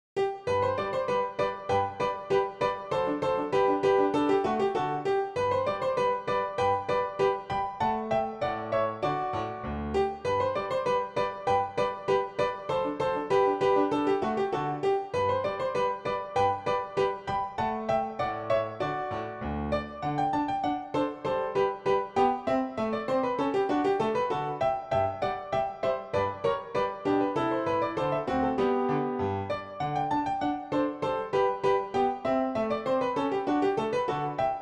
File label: Dance PianoTeq Bechstein